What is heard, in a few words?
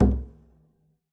pizz noise mute c, double bass